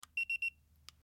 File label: triple beep